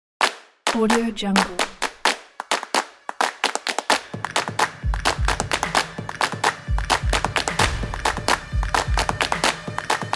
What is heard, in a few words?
stomp energy ident